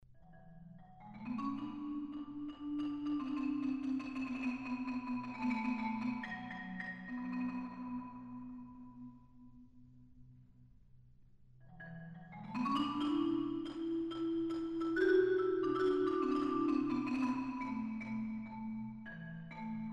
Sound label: Symphonic band